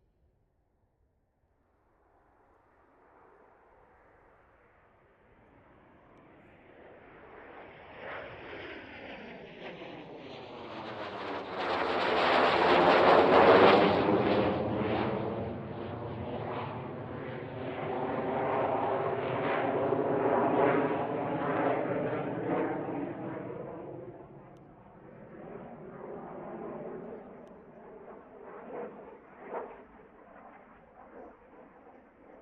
AIRCRAFT CESSNA A-37B DRAGONFLY, Jet Fighter /Bomber; LOOP; Airplanes; Vehicles